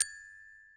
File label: gloken